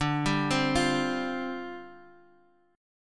G 7th Suspended 4th, D, guitar chord

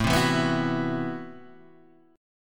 A Minor 6th Add 9th A, Chord; Am6add9 Chord {5 3 2 4 5 2} strummed

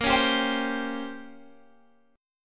Bb Minor 6th Add 9th Bb down Guitar Open G

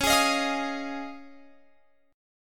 C sharp Major Add 9th C sharp, Guitar Open F; strummed; C#add9

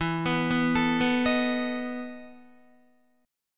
E Major 7th Suspended 4th, Guitar; arpeggiated; JGuitar

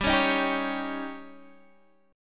C sharp Suspended 2nd Flat 5th G, chord

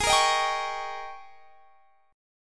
Ab Major 7th Suspended 2nd Suspended 4th Ab, down; AbM7sus2sus4 strummed

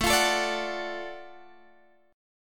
Gb Minor Major 7th Sharp 5th, chord

